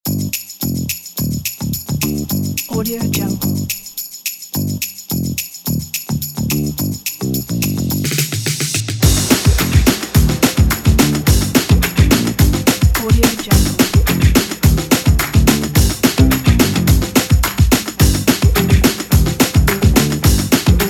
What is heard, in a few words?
funk; eliansproductions